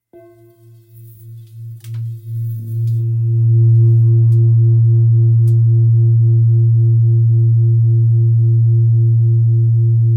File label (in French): Bol bengale la